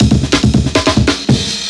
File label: Fills, Loops 140 BPM